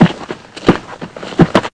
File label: SETTING UP MORTAR